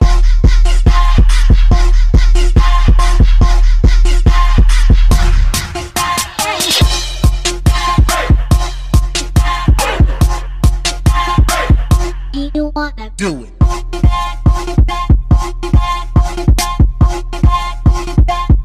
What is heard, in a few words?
hip hop ringtone